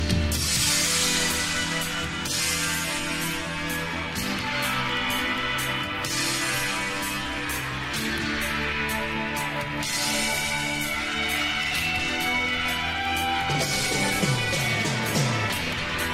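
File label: guitar ringtone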